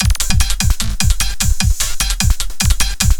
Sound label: LOOP, Eurotech; Sounds Good